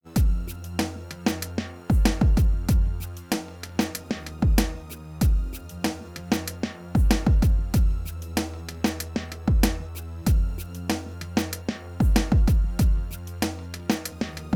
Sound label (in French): beat sans